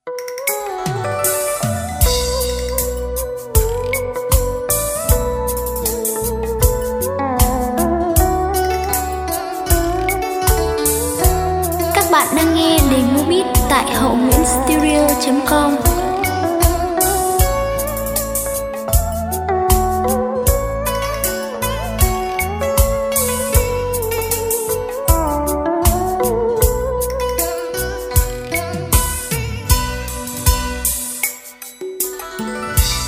beat

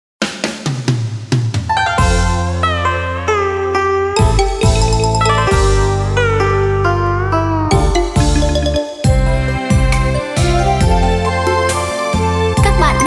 beat

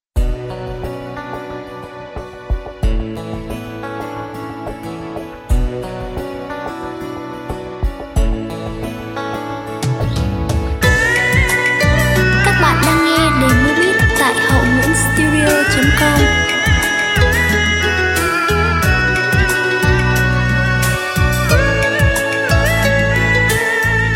beat

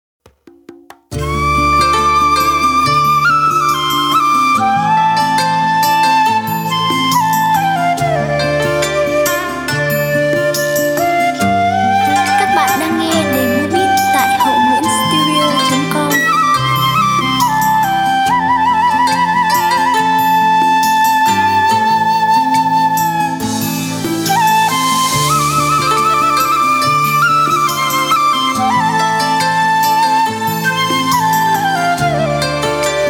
beat

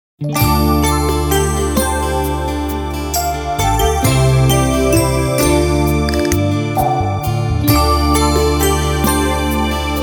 Lk beat

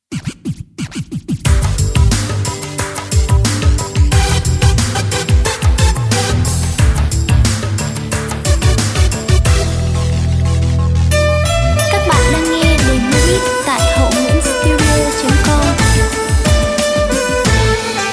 Dieu lam thon tra vinh beat

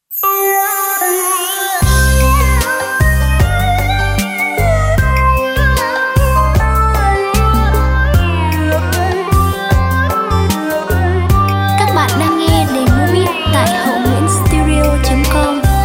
Doi thay beat

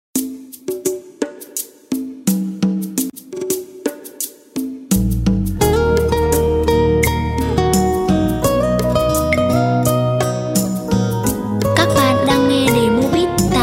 beat

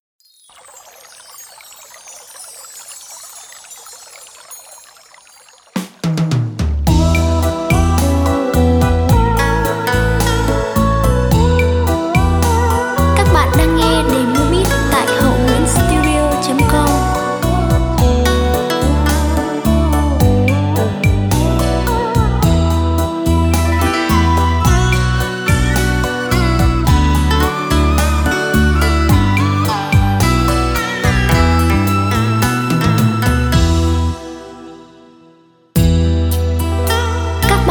beat

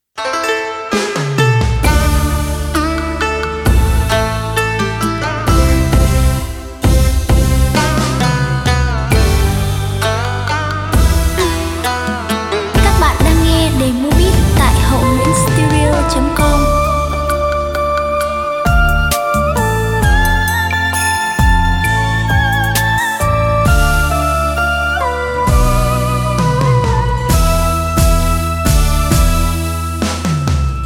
Ba thang ta tu beat

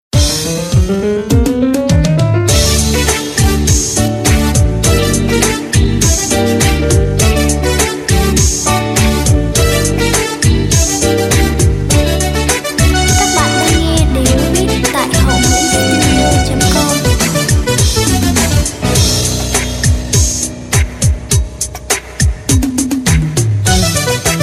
beat

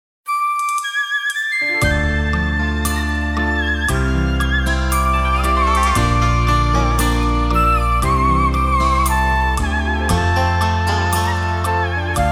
Dieu phap lien hoa beat

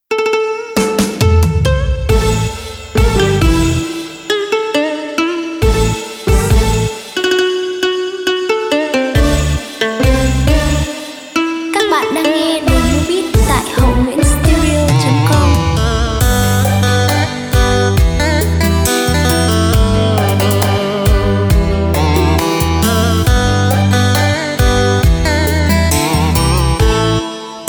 beat

